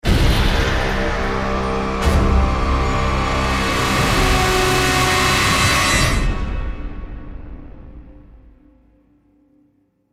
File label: lost round, music; Counter Strike; CS2